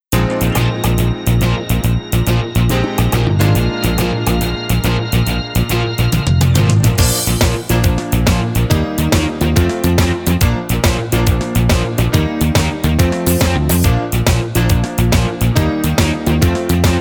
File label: karaoke